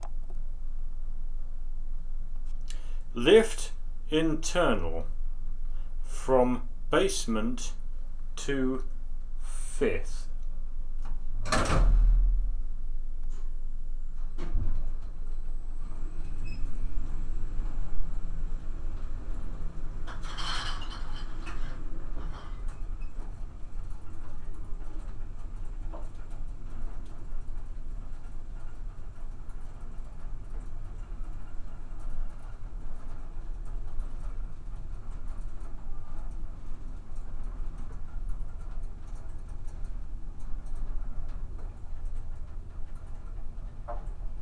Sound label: Lift up and down, Old Lift up/down